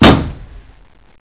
big gun

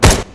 AR fire; dahl; hyperion

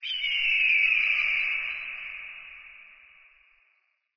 hawk, ambience

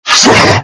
lizard hit

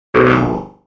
error; goliath

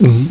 heal

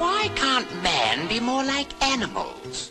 alert

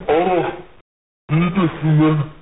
alert; votemap; overflow

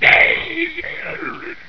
alert; custom monsters

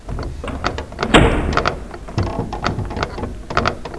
train

